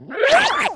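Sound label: creature womp rat attack heavy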